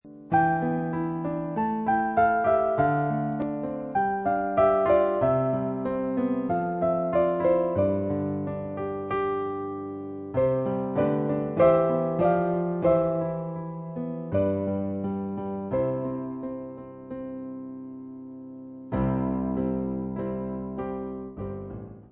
Angels we have heard piano; xmas

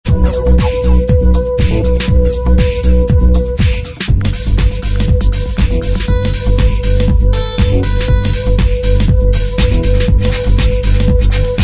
Tech Anthem

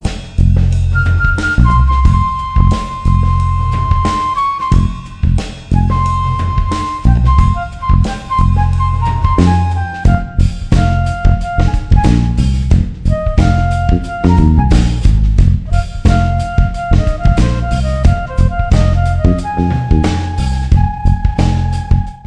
flutey funk

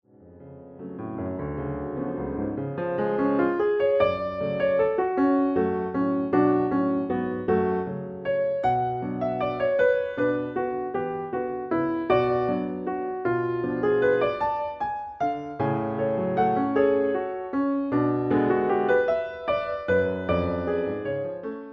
waltz

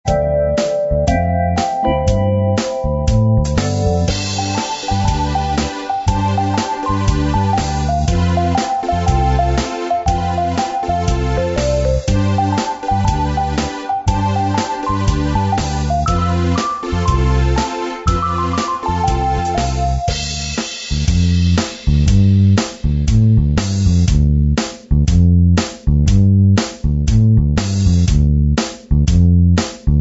Shuffle; bg-music

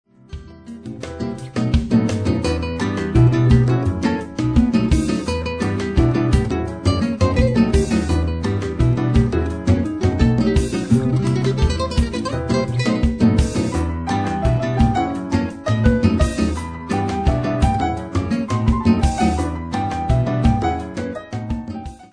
modern jazz samba

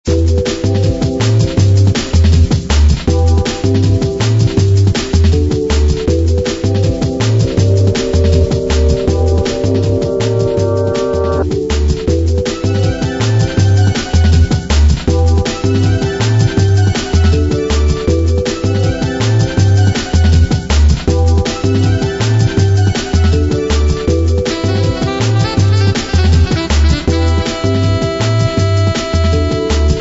Sax Drum n Bass

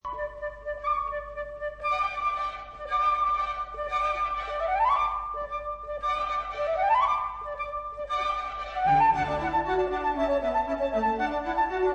allegro flute

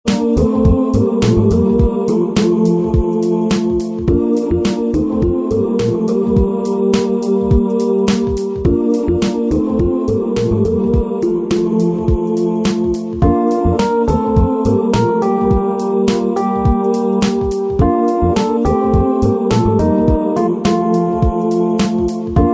Summer Groove

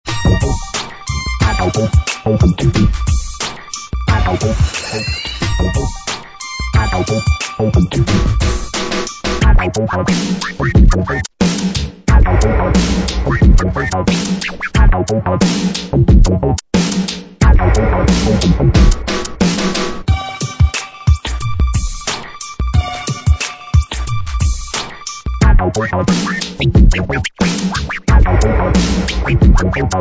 Hop

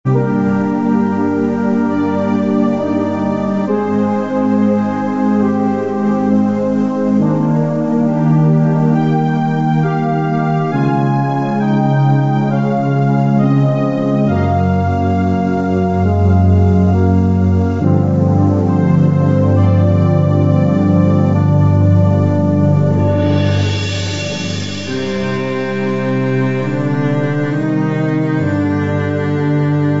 A Sad Moment

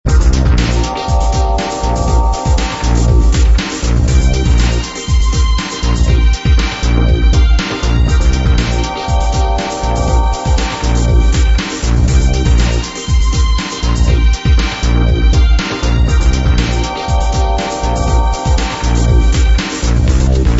electrobeat air

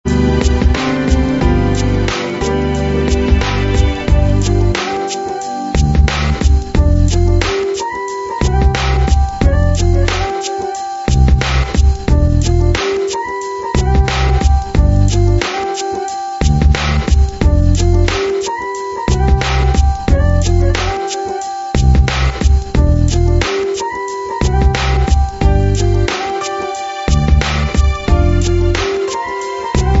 Lush Chill Out

